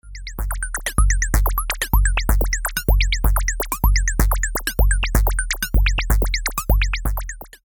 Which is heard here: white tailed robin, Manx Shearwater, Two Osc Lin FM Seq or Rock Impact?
Two Osc Lin FM Seq